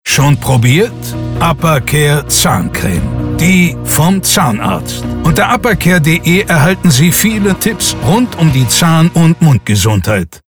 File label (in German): mit Musik; Apa Care